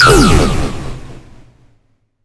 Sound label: Player death; Tachyon Project